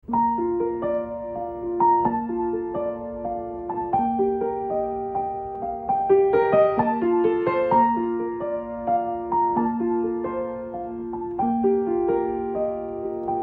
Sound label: Piano